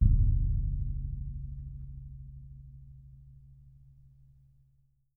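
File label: bass drum, Percussion; Miroslav Vitous - Percussion & Harp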